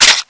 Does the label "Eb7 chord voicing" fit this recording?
No